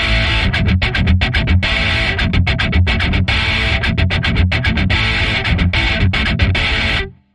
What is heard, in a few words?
Breaker Action B Guitar Only